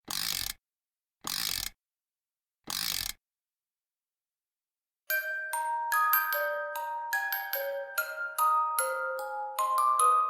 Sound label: Lullaby